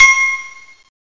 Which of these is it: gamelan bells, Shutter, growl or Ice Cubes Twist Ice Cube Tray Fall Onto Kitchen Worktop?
gamelan bells